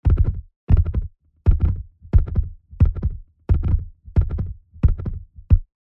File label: horse stiff dart